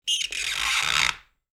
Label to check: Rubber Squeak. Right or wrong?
Right